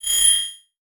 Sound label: Beep High Pitch